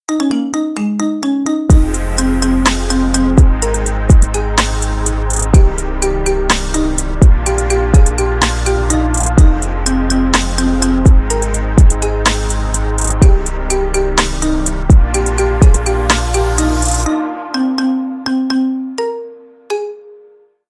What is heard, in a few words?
marimba remix rington